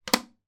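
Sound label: sounds of a smoke detector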